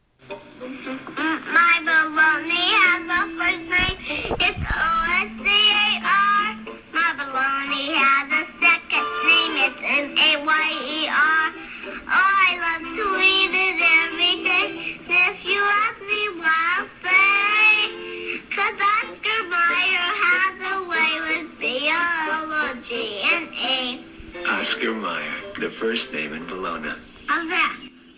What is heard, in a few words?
Jingle; Bologna